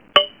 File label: doink; Cando v1.01